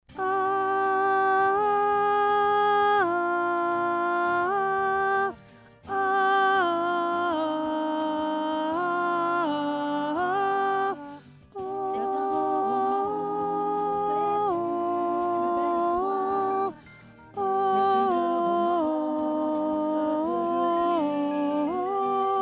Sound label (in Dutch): sopraan